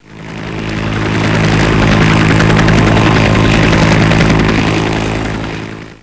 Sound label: COPTER